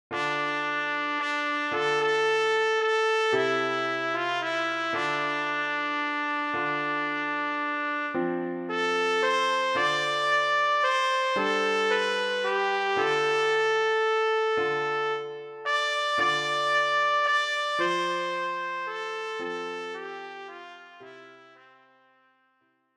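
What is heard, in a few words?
trumpet; Scarborough Fair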